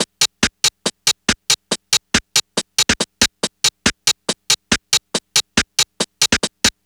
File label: NRG Percussion, Trance, Drums